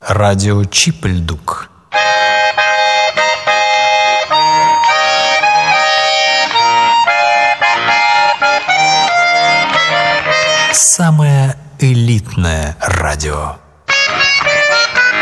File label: jingle garmonist